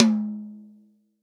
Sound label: TOM, Real Drum Kit, POP KIT